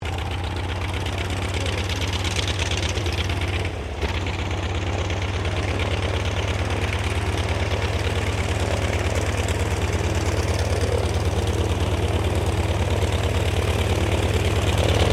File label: AIRCRAFT CAC WIRRAWAY SHUTDOWN PA STEREO; CA-16 Wirraway; Airplanes; Vehicles